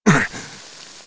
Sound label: pl burn pain, player